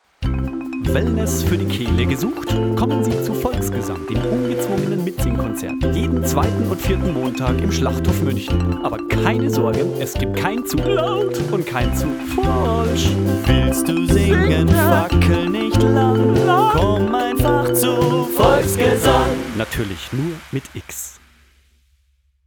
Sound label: volxgesang jingle